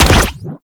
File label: Ink Rifle Reverse Ink End, Weapons; Warframe; Tenno